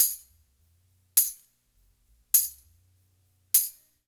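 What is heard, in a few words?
tambourine, Loops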